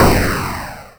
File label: Gunshot